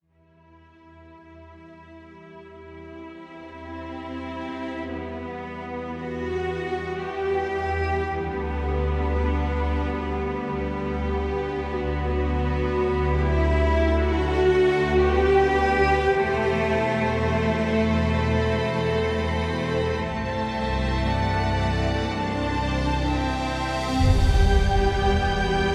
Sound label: Virtual Orchestration